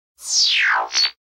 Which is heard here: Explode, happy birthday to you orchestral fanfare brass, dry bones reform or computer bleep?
computer bleep